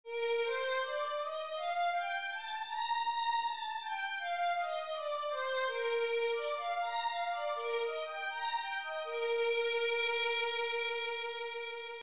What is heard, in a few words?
violin B flat major, scales 1 octave